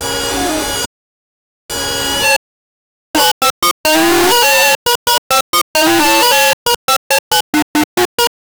create a sound of a, formula 1

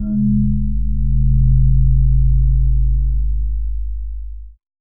reggae bassline strum sta

